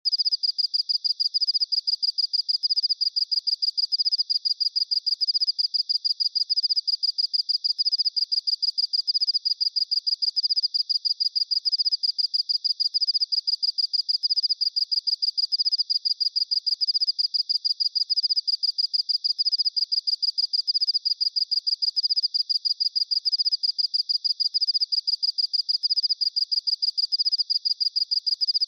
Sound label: T. oceanicus calling song